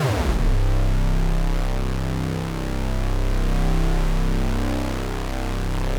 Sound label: Memorymoog, bass; OP-Z